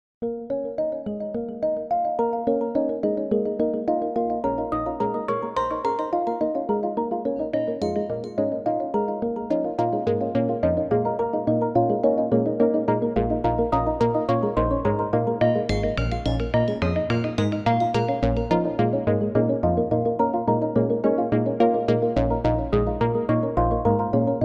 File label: KORG wavestate Werksound Stereo Out; Train To Berlin